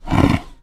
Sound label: Leopard Breath Snort; big cats